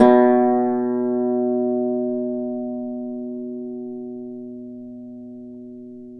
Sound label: Celtic Harp, Plucked Strings